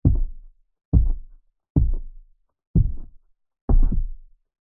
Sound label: household movement thud low frequency as if next door upstairs or through wall